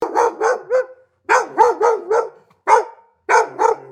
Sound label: gongong